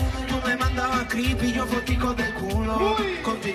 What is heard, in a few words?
uyyyy